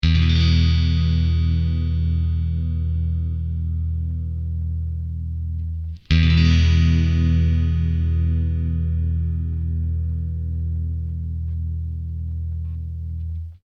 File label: clean neck coil; smoke stack ii